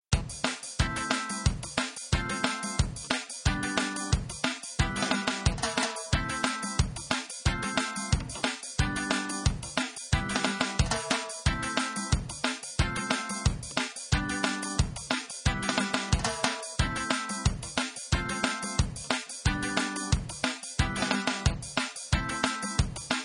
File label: rhythm